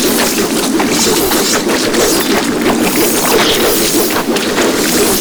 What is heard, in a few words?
Blades Loop; Warframe; Garuda